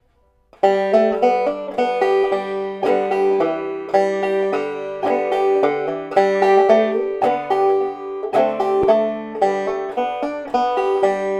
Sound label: shove the pigs foot, banjo